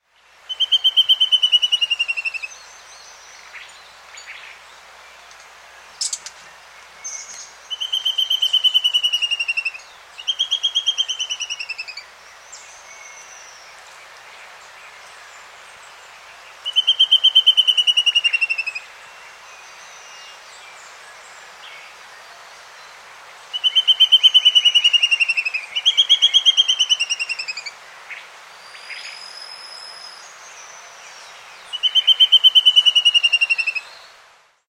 Rufous-Backed Antvireo; S. America

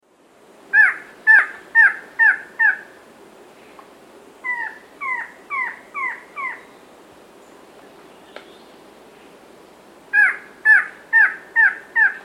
Uniform Antshrike, S. America

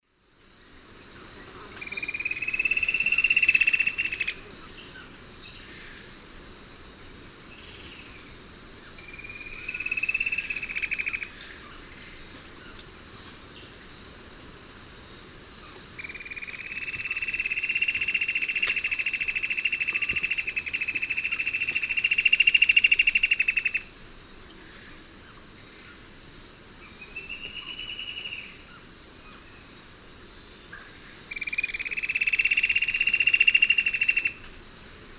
Myrmotherula multostriata, rattle song male; S. America; Eastern Lowlands

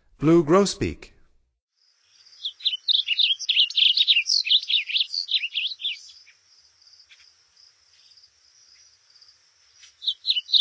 Blue Grosbeak, Songs of Birds of North America; Stokes

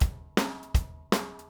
Drums, Rock

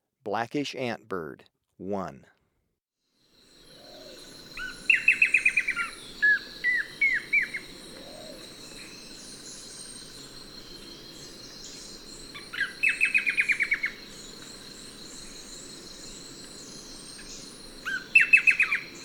Blackish Antbird, Birds of Ecuador; S. America

